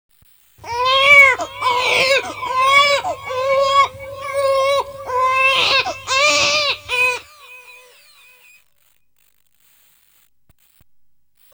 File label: Final Boss Start; Super Darius II; PC Engine